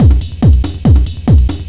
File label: 141 BPM, Drum Loops; Zero-G; Club Techno; Drum Set